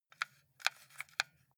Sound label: console controller xbox joystick move multiple